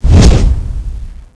attack, melee miss; The Monster Plane; kolmods

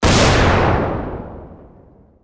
fusion; Garry's Mod; promenade upgraded fusion tool

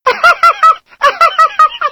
hunter shred; huggy wuggy snpc; elmo

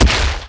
step; The Monster Plane; warlock golem

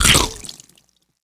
bite hit; The Monster Plane; kolmods melee

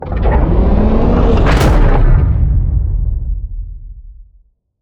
megamek move; skibidi toilet emperor

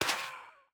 break, soulsand; The Monster Plane

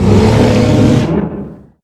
battle cry bear; nightmare bear

